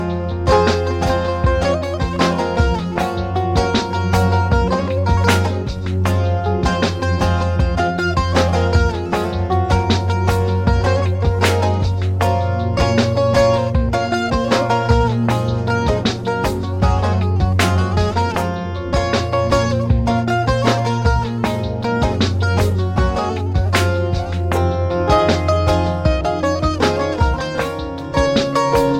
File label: Saz; Leyla Il Mecnun